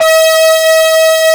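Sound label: PWM brass C4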